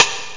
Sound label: KLICK; Groovy Bytes: Behind the Moon; BAS PERC